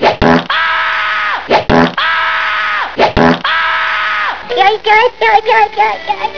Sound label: fart pain